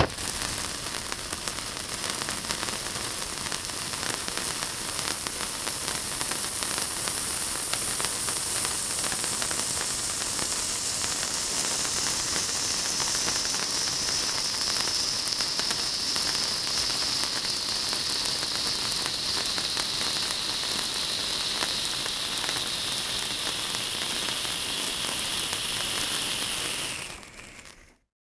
birthday cake fireworks, noisemakers; NS Studios - ultra sound collection

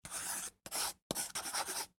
Write on Cardboard with Pencil; Office Supplies; 1001 Sound Effects

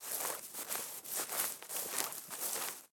Footsteps Grass fast; BLIND QUEST - The Enchanted Castle

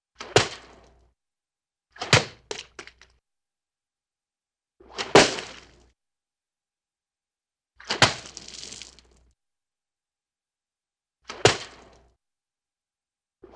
Liquid Impact - Various Wet liquidy Impacts; Cartoon; Hollywood Edge; Cartoon Trax

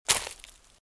Footsteps Leaves Walk Right; RadwinIsland

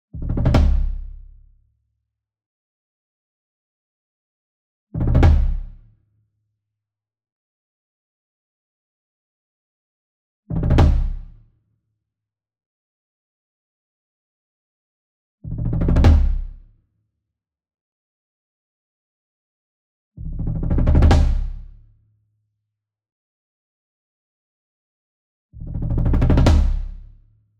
SINGLE DRUM Tom Concert Roll Soft Beater Near; boom library; Cinematic Strikes Construction Kit

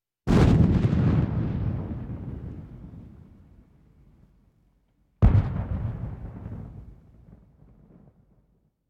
Military vehicles; Valentino Production Sound Effects Library CD50

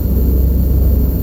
Sci-fi UFO Loop; SFX Kit; Sci-Fi Loops

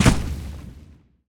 Earth Tank Footstep; AudioWizards

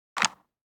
DICE on Cardboard, Grab, Standard, 4 Four Dice; Samples; ArticulatedSounds; DICE Sfx Party (Extended)

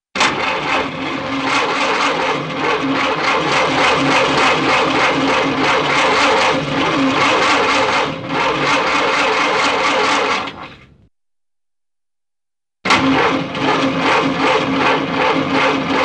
Old Car False Starts, Cartoon; Hollywood Edge; Cartoon Trax

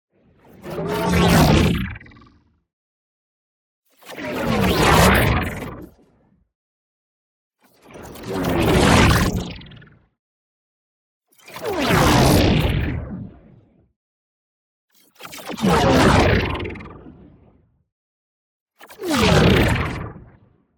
WHOOSH MEDIUM Icky Droid; boom library; Matter

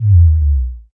Scifi Power Up Lights; Energy & Forcefields

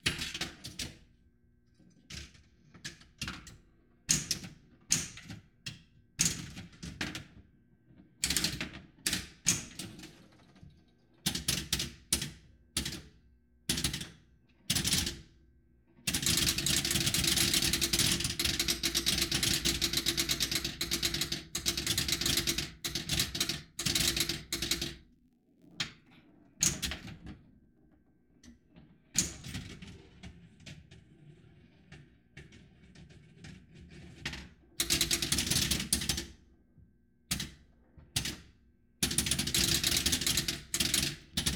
Pinball Play

